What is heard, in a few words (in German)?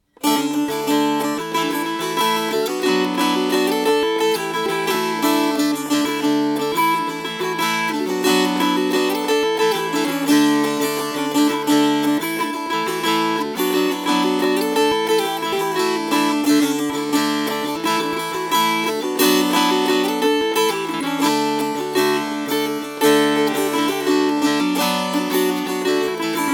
Schottisch, Fast